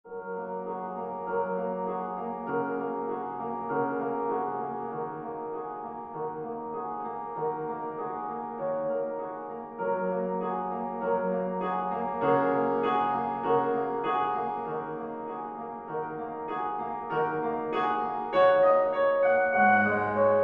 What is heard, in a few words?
midi segment